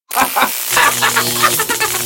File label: Emote Electro Giant Energy Flow